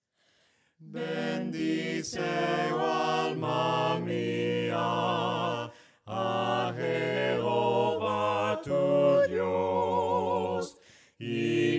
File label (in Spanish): VOCES, himnos a capella; cantos del camino